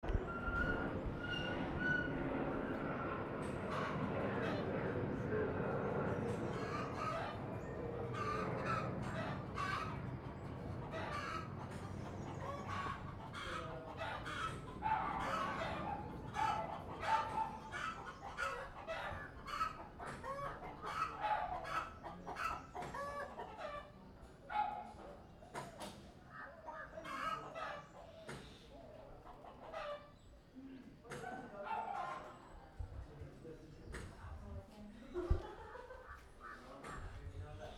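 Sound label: screech chicken voices, Kathmandu; Kathmandu Ambient sounds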